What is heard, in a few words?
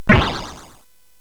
SFX; Narco Police; hvsc